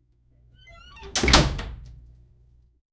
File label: 32 bit door opening; 8-bit